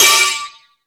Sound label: Crash, Drum Machines E-MU Pro-Cussion